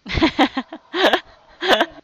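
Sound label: inhale exhale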